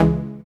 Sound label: TECHNO Ab2, dubstep; Jack Sparrow Deep Dubstep Progressions